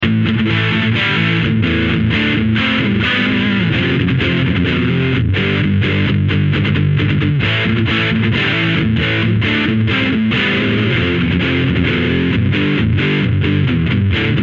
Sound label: distortion